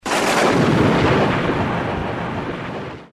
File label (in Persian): Raad